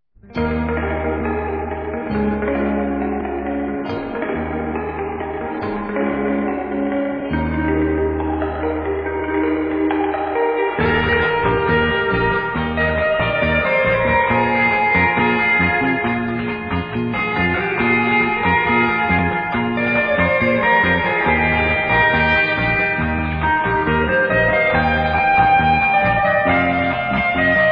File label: Lovers Guitar